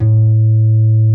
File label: A1, synth pluck; Music Store - Claudius Diemeter Schwerlos